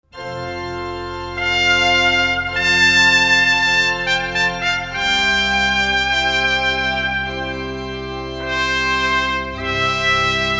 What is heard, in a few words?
Sonata for Trumpet; Glovanni Viviani; Sonata 'Prima'